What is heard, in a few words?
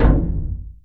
Hit; Mars Sortie